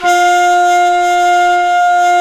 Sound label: WND FLT MT, Flute & Mute